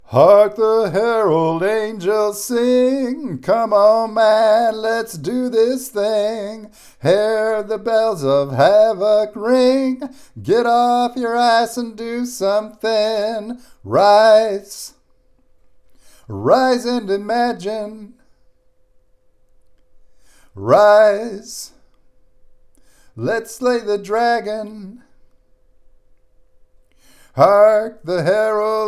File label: vocals raw